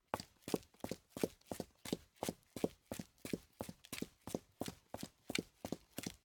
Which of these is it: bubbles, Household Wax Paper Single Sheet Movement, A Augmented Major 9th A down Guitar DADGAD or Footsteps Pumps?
Footsteps Pumps